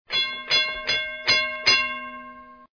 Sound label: ding ding